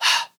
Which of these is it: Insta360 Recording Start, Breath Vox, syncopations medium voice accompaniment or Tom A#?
Breath Vox